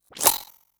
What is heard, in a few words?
Glitch, noise